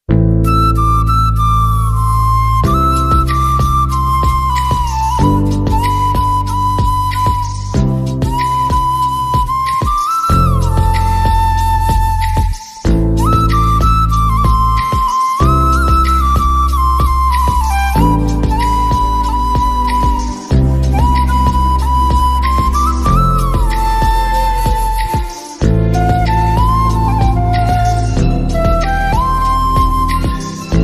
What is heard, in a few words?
ringtone new flute ringtone